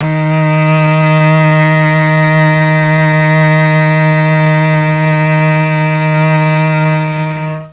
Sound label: D#3 TRON CELLO; Mike Pinder - Mellotron Archive CD